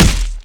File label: flesh hit